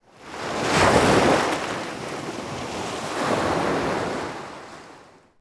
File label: ocean short; acqua; Amare il Mare; I SUONI DELL'ACQUA